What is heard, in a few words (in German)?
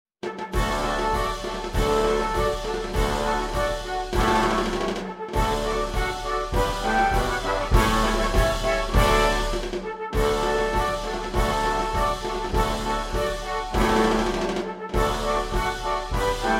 Marsch; Revue Marsch Nr 1 for Slesvigske Jægercorps - originalbesætning